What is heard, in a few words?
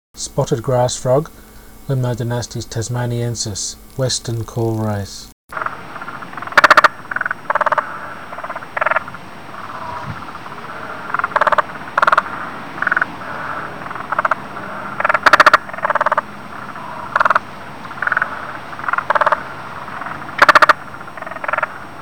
Limnodynastes tasmaniensis - Spotted Marsh Frog; Frog calls; Oaklands Wetland; City of Marion Oaklands and Warriparinga Wetlands